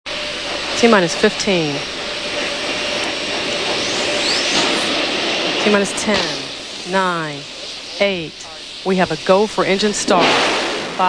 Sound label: STS 95 launch; Space